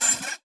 Multimedia Internet CD-Rom Flash Whoosh

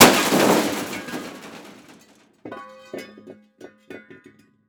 Crash Car With Rim Rocking On Pavement; Crashes

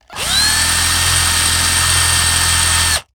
Industry Tool Power Drill Fast Vibrating

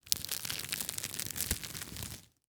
Industry Tool Rope Twist

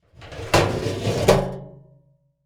Garbage Can Remove Lid Metal; Household

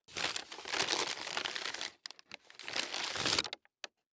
Household Paper Bag Rustle

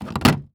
Electronic Phone Hang Up; AdobeCC

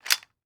Weapon Gun Rifle Military M1 Carbine Bolt Release; AdobeCC

